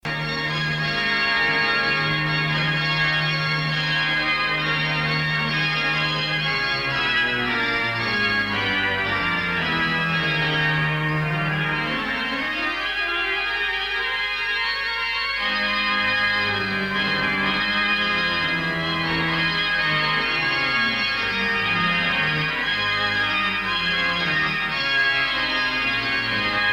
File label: church organ; st john s old coulsdon